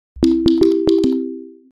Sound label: beep